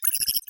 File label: logo